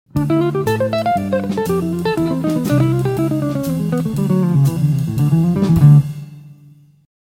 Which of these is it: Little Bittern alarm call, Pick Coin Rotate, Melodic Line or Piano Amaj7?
Melodic Line